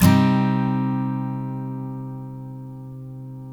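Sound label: steel C minor down, CHORDS STEEL, Guitars; Spectrasonics; Hans Zimmer Guitars VOL-1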